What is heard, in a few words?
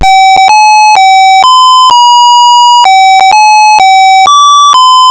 happy birthday, melody